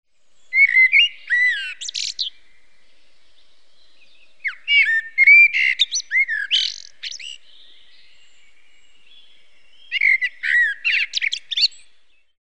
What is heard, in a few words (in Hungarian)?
fekete rigo